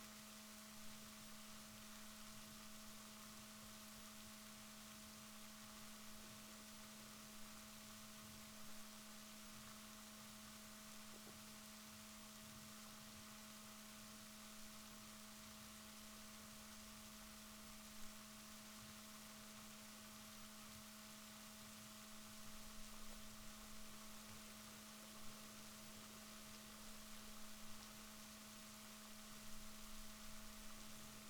rorquals positif, Balaenoptera Physalus Chile

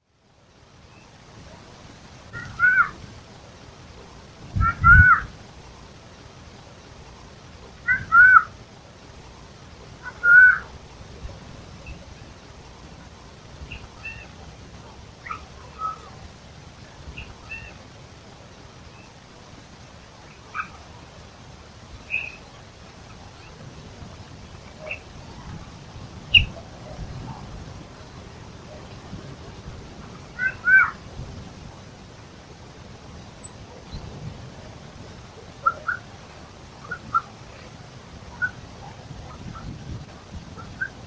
Garrulus glandarius; SETE

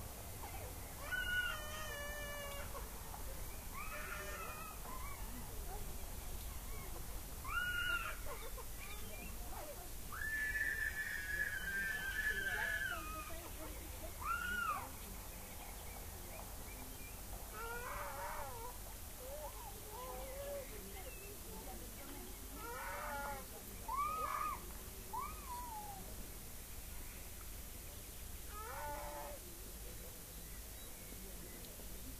rayol farm; SEAMED

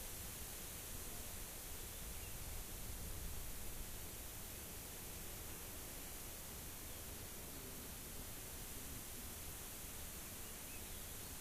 rayol farm; SEAMED

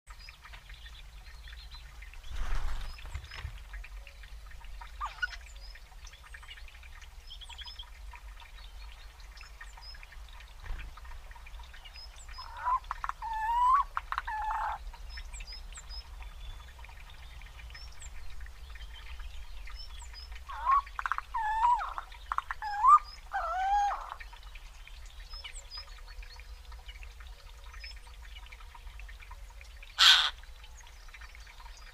Garrulus glandarius; SETE

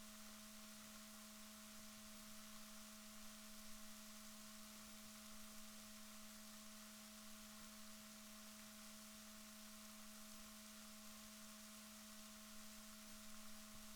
rorquals positif, Balaenoptera Physalus Chile

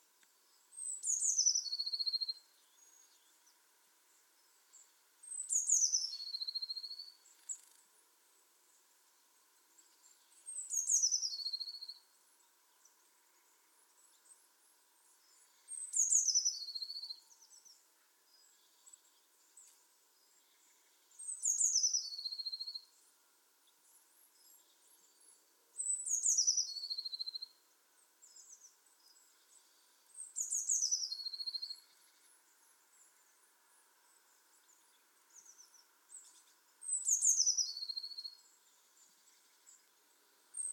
Cyanistes caeruleus; SETE

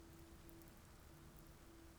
pulses, BALAENOPTERA; MONACO 2022-07

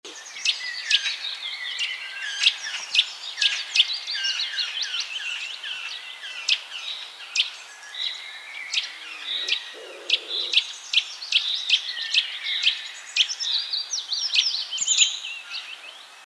Sitta europaea; SETE

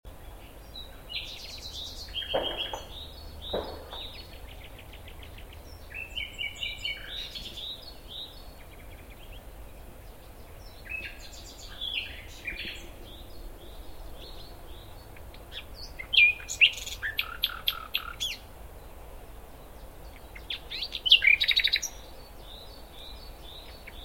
Luscinia megarhynchos; SETE

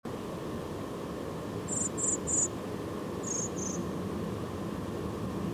Turdus merula; SETE

